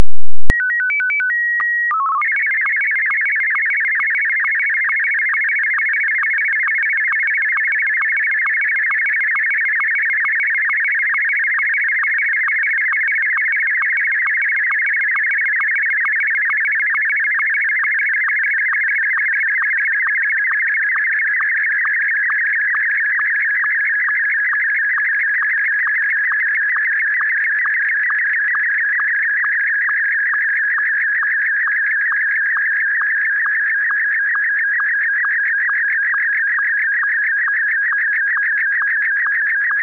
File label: sstv; 22050 Hz, mono; 320×256